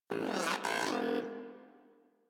Moa Aggressive Vocal Disagree; Warframe

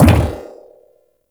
shock rifle projectile bounce; Warframe; Weapons; Corpus

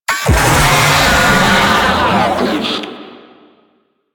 Proto Infested Player Spotted, Vocals; Warframe; Babau

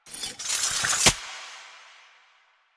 Idle Sword Twirl; Arthur Hub; Warframe; NineteenNinetyNine

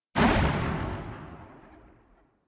Arch Burst Gun Tail, Weapons; DE; Warframe; Archwing

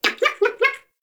Baby Vocal

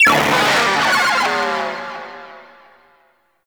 Arcade Fail

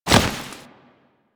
Hoverboard Land; Warframe; Vehicles; Venus; anim sounds

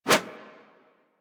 Throwing Dagger Idle Throw Whoosh; Warframe; Weapons

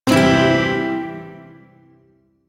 Shawzin Phrygian Chord; Warframe; Duviri Shawzin

